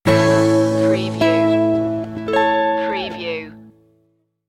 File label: on the range stinger